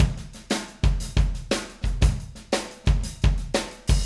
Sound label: Acoustic Live Drum